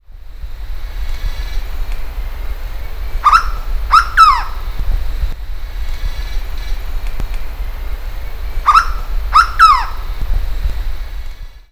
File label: Carponis cuculatta; N Cunha; Silveira